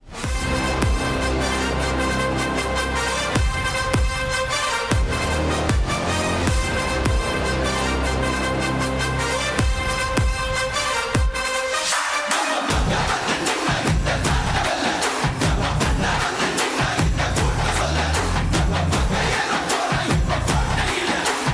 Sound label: Intro BGM; Unga Vijay; tvk ringtones